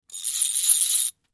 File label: Fishing Reel Reels In, fishing pole